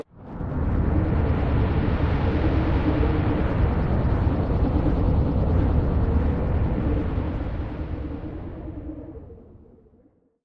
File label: cosmic whirlwind; Stellaris; astral planes